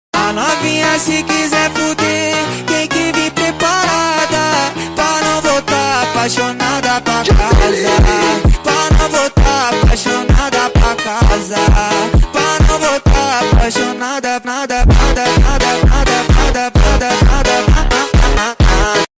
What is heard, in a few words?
phonk drift funk bass bassboosted